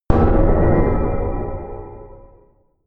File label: sub wobble distort; hits impacts